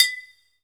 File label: Bottle Hit; Jungle; Pecussion Hits; Zero-G Jungle Warfare 1, 2 & 3; 90 s Sample CDs